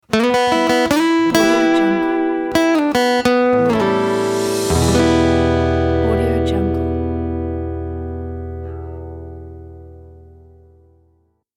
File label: acoustic guitar blues logo intro